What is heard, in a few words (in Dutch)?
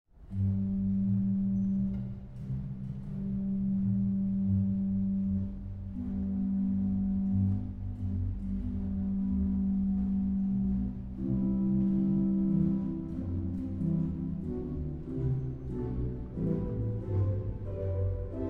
Improvisatie (hoofdorgel en trompet); Door de nacht